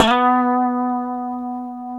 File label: B 3, Guitars; Spectrasonics; Hans Zimmer Guitars VOL-1; 90's Sample CDs; COMP POP+VIB